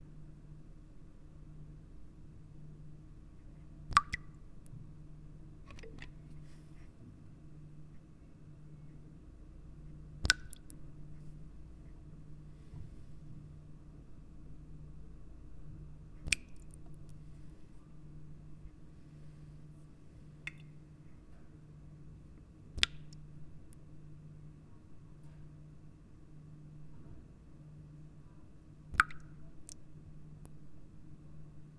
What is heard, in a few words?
Drip